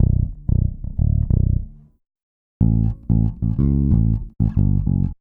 092 Latin HipHop Bass Bbmin; Real Bass; Digidesign; Pro Tools Essential Loops

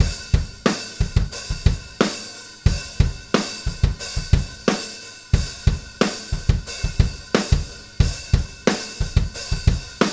Rock Drums O-Hat; Pro Tools Essential Demos; Essential Loop Demos; Demo Session - Rock Hard; Digidesign

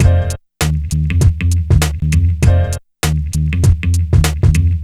HipHop 60'S Combo F maj; Digidesign; Pro Tools Essential Loops

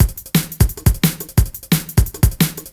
175 D&B Drums

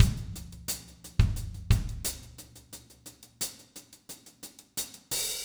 088 Rock Drums Verse; Pop Rock; Moderate Tempo; Pro Tools Essential Loops; Digidesign

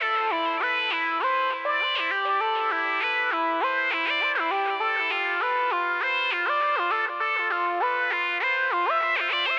Latin HipHop Synth A min; Digidesign; Pro Tools Essential Loops

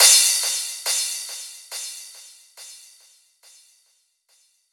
140 House Crash Delay; Dance DJ; Drums; Cymbals; Digidesign; Pro Tools; Pro Tools Essential Loops

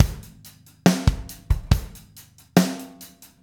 070 Slow Rock Drums; Pop Rock; Slow Tempo; Digidesign; Pro Tools; Pro Tools Essential Loops

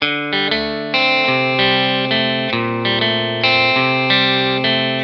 095 Pop Guitar Lick D maj, Electric; Pop Rock; Electric 055-099 bpm; Pro Tools Essential Loops; Digidesign

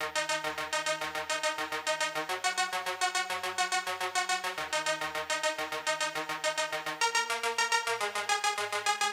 Wave Synth Arp Db; Pop Rock; Pro Tools Essential Demos; Digidesign; Essential Loop Demos; Demo Session - Pop Rock

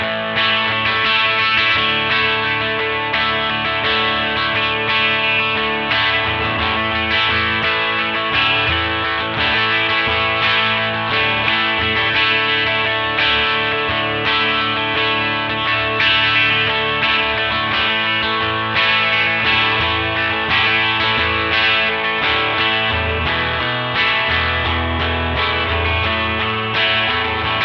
clean gtr EQ; Pro Tools Essential Demos; Digidesign

